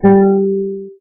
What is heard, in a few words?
guitar; gcompris; memory-sound